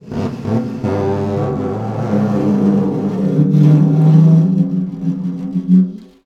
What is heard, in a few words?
Snare Drum; Percussion Samples